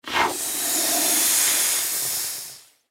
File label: Latex Glove Blow, Foley Latex Glove Blow Up Single Breath Balloon; Balloons; Blastwave